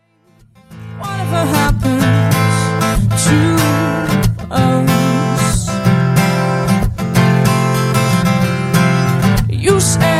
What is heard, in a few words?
Acoustic